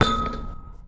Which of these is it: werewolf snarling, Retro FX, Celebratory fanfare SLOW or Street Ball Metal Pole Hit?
Street Ball Metal Pole Hit